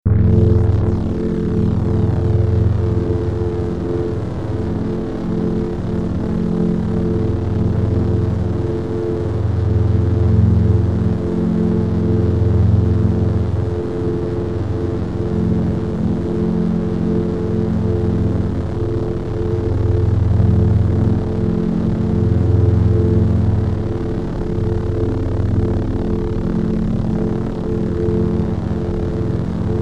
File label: Double Bladed Saber Sound; FXHome